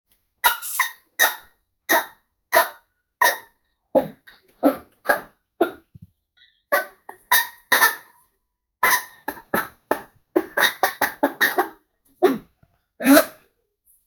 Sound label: falling objects